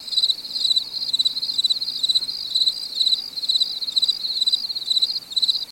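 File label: Crickets Cicada Night Exterior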